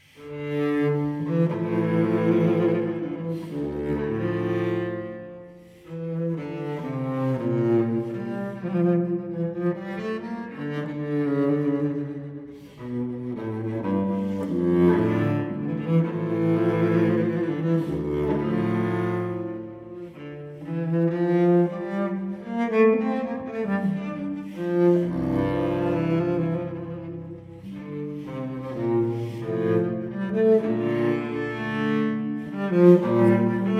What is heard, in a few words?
Cello Suite No. 2 in D minor Sarabande; BWV1008; Johann Sebastian Bach